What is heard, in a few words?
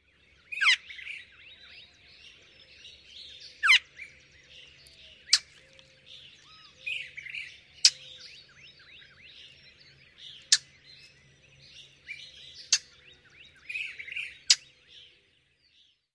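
Long-billed Thrasher calls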